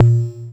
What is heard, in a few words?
A#, Synclav FM PERCUSSION; Big Fish Audio